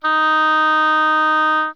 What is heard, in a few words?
oboe